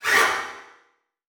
Multimedia Internet CD-Rom Flash Whoosh Short

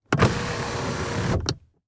Chevy Trailblazer Close Sunroof Fast; transportation

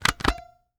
Technology Electronic Joystick Stick Moving

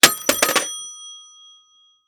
Technology Electronic Phone Drop Rotary Phone

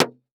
Impact Milk Carton Plastic Hit By Wooden Stick; Impacts

